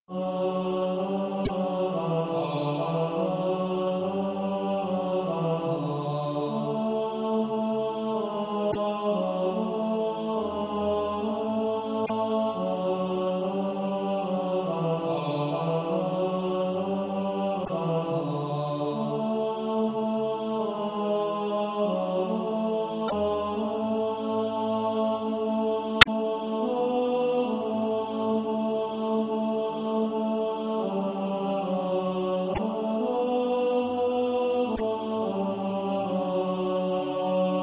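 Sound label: tenor; Lord dismiss us with thy blessing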